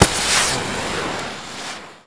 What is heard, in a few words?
explosion die